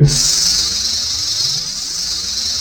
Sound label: Korg, FX